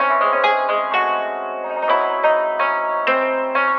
guitar japanlike

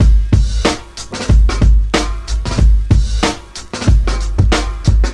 grunge, funky drum loops

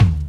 Toms, Real

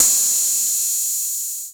Hats Electro Open

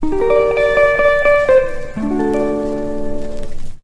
guitar hawaiian, Loops

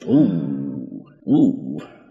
"ooh ooh", voice

